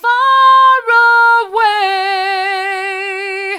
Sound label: faraway hard, vocal